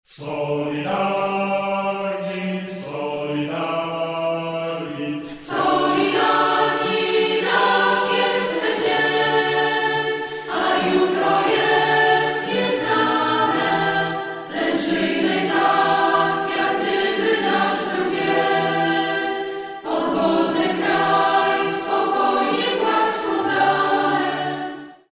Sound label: acapp